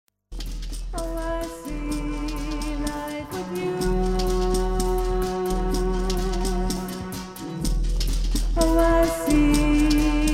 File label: cello chill sounds